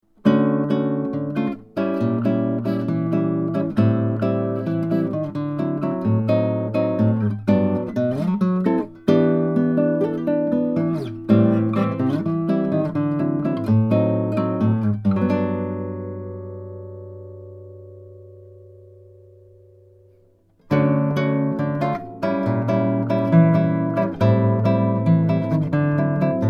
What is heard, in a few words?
bossa